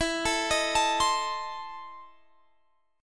E Major 7th Suspended 4th Sharp 5th E, Guitar, Standard; arpeggiated; JGuitar